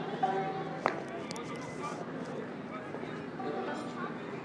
twing twang string band

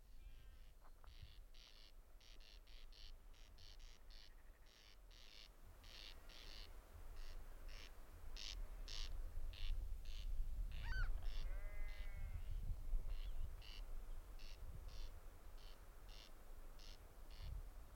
corn crake

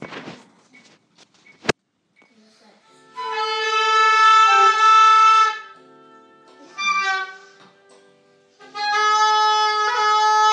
oboe and flute

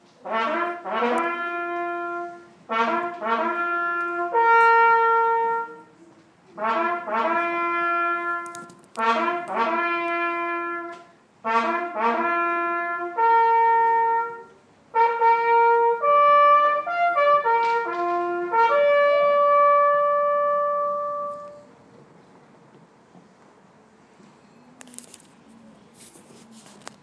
reveille

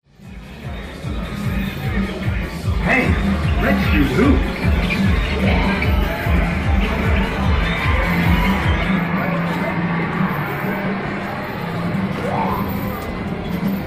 southwold pier amusements

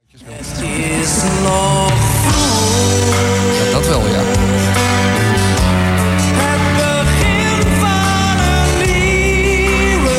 jingle; stenders vroeg

